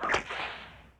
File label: Whip